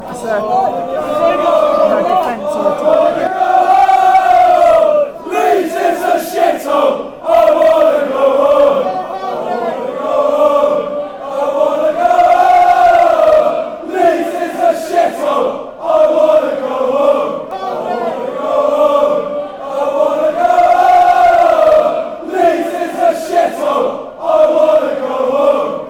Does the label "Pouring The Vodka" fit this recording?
No